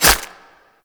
Infested Packet Claws, melee attack; Warframe; Infested Lich

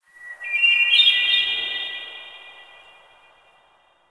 Wetland Forest Hermit Thrush

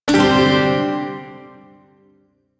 Shawzin Pent Maj Chord B; DE; Warframe; Duviri Shawzin

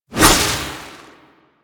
Hover Whizzby; Enemies; Deco Hover Whizzby Envy; Warframe; Warframes; Pax Duviricus

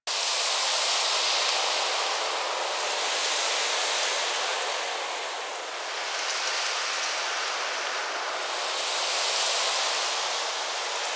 Operator Room Glowing Tree Loop; Warframe; Ambience; Orokin

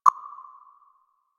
Hub Concourse Computer Beep; Warframe; Ambience; Tenno Hub Concourse Fragment

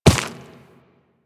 Run Dirt, Footsteps; Warframe; Operator

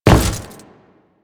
Step Default, Footsteps; Enemies; Warframe; Grineer

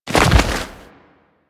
Roll Forward Foley D; Enemies; Warframe; Teshin

